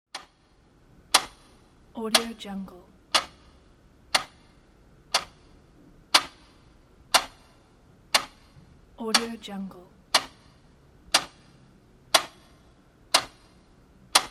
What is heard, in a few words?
clock ticking